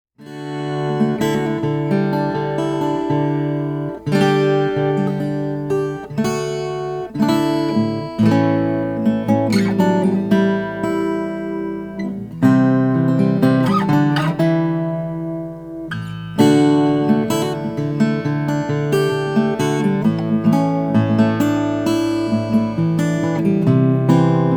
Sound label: 0 12 old european maple, guitars; rasmussen